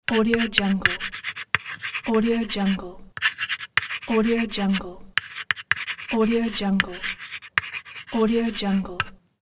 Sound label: writing on blackboard